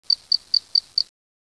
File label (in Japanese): mitsukadokoorogi; insects